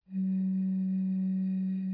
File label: bottle